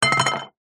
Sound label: foley metal rod hollow short drop wood deck